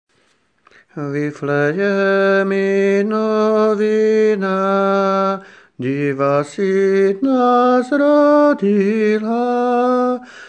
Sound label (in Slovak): vviflejeminovinatenor